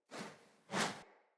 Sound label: Great Sword Whoosh Accent Air Heavy; Weapons; Player; Swords; Mendicant Great Sword